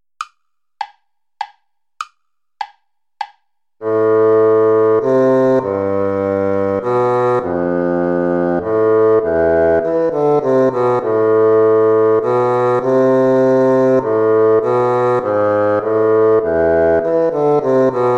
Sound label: minuet play along; bach johann sebastian